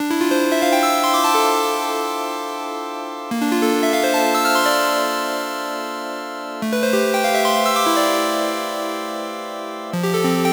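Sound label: synth loop vcr intro 145 D minor; Virtual Riot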